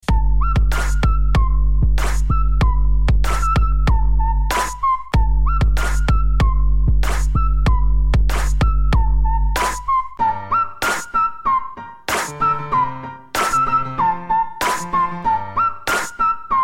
Trap Whistle Piano

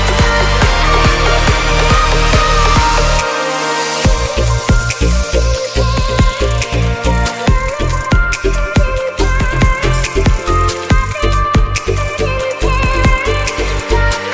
Chill

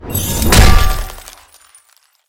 Hammer; Sub-Zero

